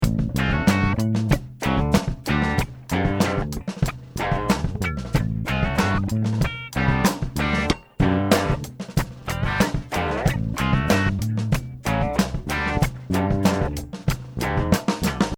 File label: instrumental